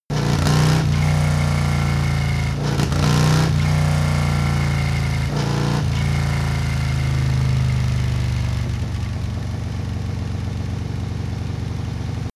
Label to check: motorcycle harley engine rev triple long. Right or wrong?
Right